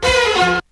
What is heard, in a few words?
string down